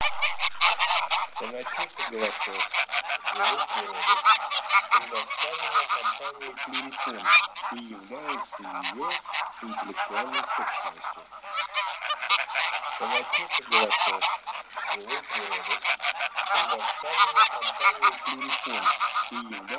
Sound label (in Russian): Гусь серый Oca Selvatica; plurifon